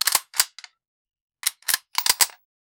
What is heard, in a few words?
a sharp high fidelity ak, firearms